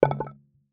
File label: Crafting UI Tab Button; User Interface